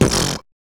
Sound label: Human Perc